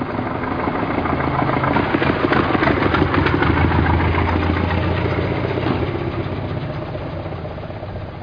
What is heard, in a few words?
buggy putt; How Many Bugs in a Box; juicebar